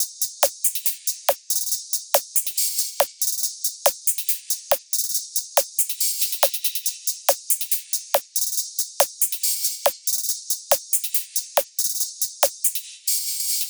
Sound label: 140 drum loop no kick; stratus